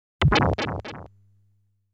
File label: Ground Enemy Out